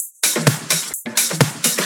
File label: Percussive Loops 128BPM; Vengeance Samples